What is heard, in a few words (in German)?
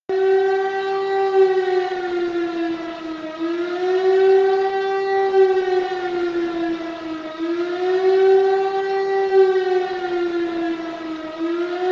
Feuerwehrsirene auf abschwellend